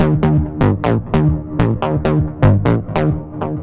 ripping lead Eb 132, synth loop; Synth Loops; Zero-G; Soundclash Nu Dance Electro; Acidized; 132bpm

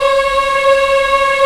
VOX African; Roland LCDP11 Africa VOL-1

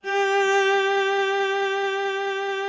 VIOLA G3; Greytsounds VOL-1; VIOLIN+VIOLA

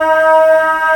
MALE AH D#3, SOLO, Africa; Spectrasonics; Heart of Africa 1

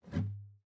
GUITAR FX; Xsample VOL-2

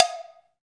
PER COW, Cowbells

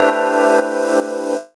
Chords Tones; Equipped Music; Loungin House

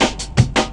helicopta cuts, drum loops; Zero-G Jungle Warfare 1, 2 & 3

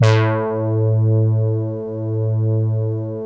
BIT BRASS, Vintage Keyboards

